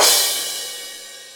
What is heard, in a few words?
soft hit finish